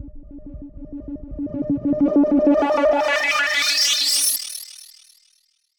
Filter Proc B 110, alarm siren samples, 110bpm; musicradar

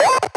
8Bit Fx; Antidote Advent

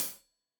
Hat Tight, Hi-Hat, Cymbals; Deeply Sampled Drums; Stereo

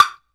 WOOD, Perc Toys; Spectrasonics; Supreme Beats; World Dance

